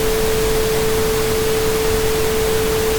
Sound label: Sine And Noise